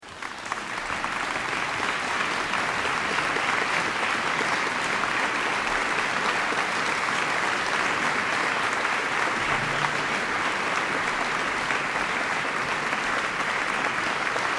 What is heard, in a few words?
Applause; mozart; Richter